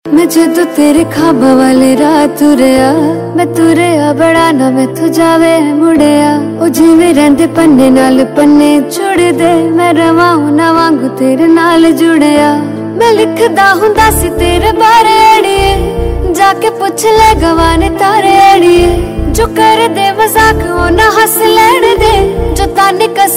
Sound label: Female Version